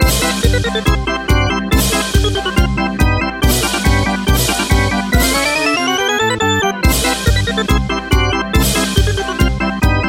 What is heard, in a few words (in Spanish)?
musica de circo ringtone